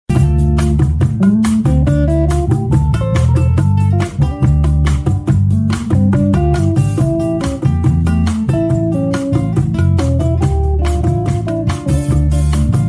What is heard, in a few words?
Bass